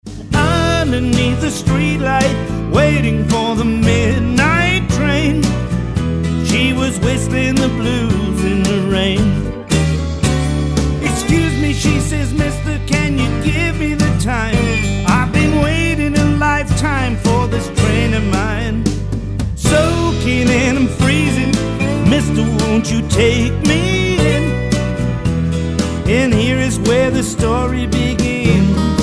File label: Whistling In The Rain